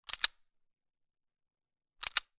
weapon gun walther ppq; still north media

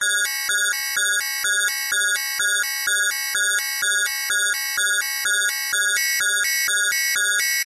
science fiction alarm loop

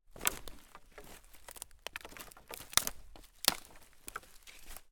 foley footsteps twigs small branches forest floor

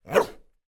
animals dog med bark inside

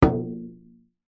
drum tom hit strike single high pitched

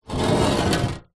wood drag scrape on rusty metal table

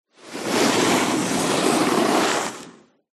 Snowboard Stops

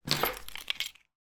household key car electronic pick up from table

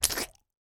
human mouth wet open squelch; sound effects one

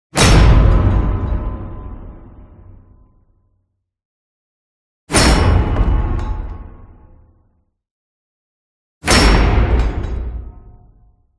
CINEMATIC IMPACT Hit Metal; smartsound

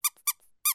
toy squeaky squeaks

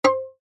musical violin string pluck high pitched

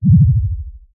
cartoon warble low frequency wobble; zapsplat

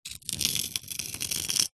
foley concrete scrape with metal sharp

